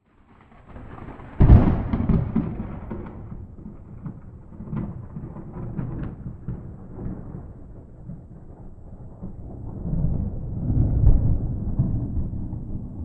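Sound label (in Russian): далекие раскаты грома; звук грома; Сильный гром без дождя, гром с раскатами и одиночные удары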